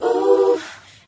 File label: "ooh" sung